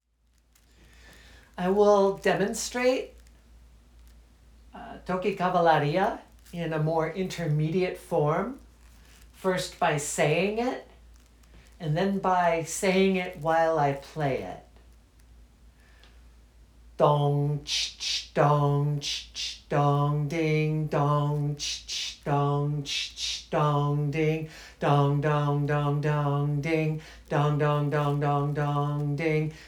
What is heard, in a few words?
Cavalaria intermediate demonstration; Click below to listen to me demonstrating this intermediate version of the Cavalaria toque; Capoeira Music Practice Material